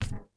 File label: fs hMetal, footsteps